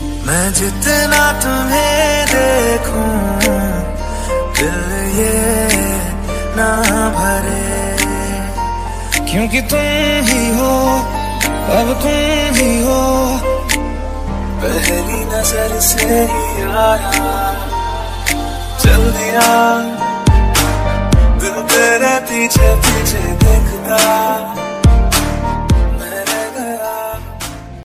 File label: bollywood ringtone